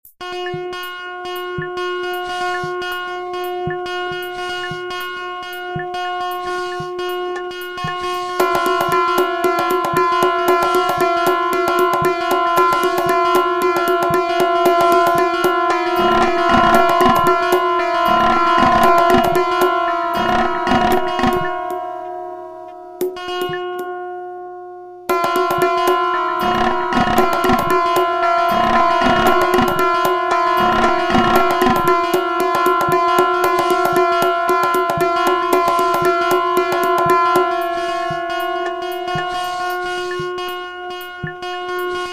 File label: Horror music